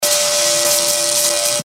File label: electric tone and arcing